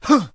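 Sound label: man exercise; Mount&Blade Warband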